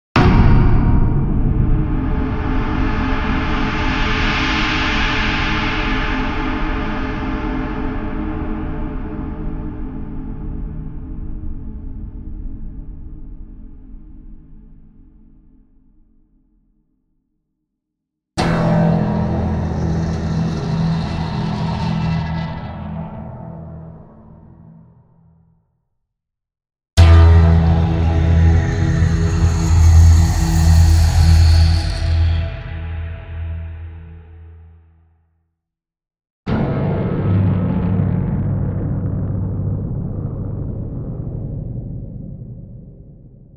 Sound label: Piano Hits